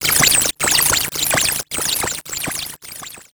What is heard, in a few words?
Title Transition Data Transmission Terminated; Production Element Title Transition